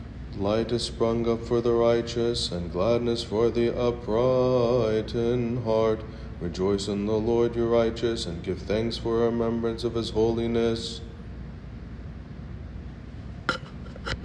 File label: Psalm Chanting Matin Annual